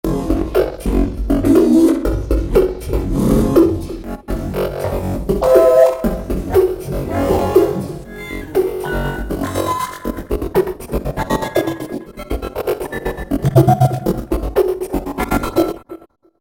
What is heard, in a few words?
Voice Chaos; V-Synth